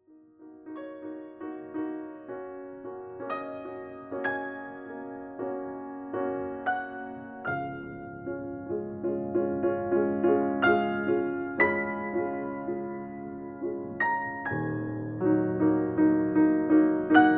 Improvisation fragment

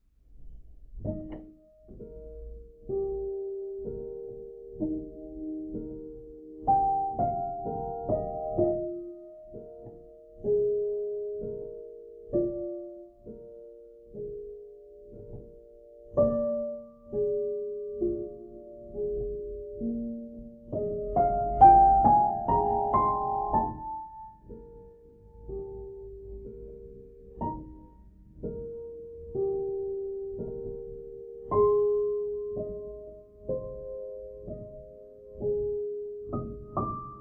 Slow Mozart; Albumleaf 129

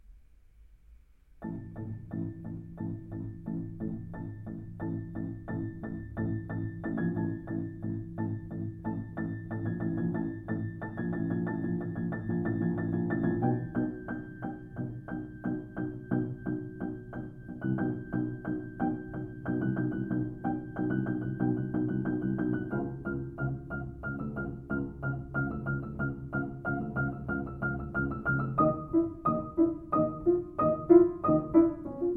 Pulse Phase; Albumleaf 118

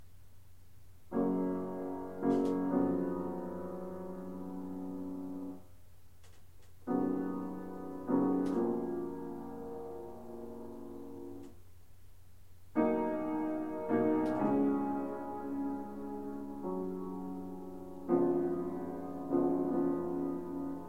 piano; Albumleaf 17; The Rotunda

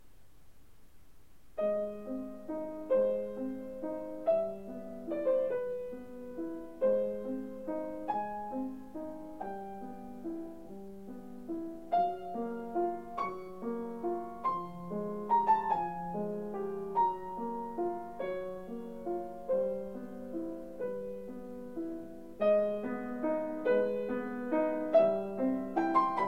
Melody; Albumleaf 4

